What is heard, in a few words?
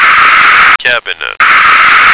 cabinet